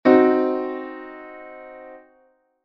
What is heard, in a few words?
chord